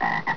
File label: ocean question; 250 Desktop Themes